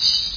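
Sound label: maracas chime ringing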